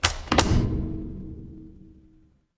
door close

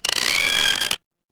cartoon stretch

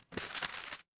paper fabric crumple

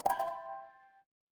feedback merge

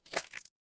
cartoon splat

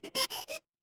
cartoon squeak

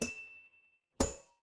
office elevator

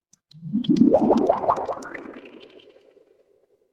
water bubble

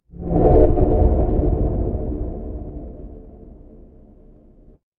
magic scifi teleport

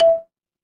feedback confirm

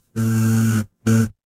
feedback error

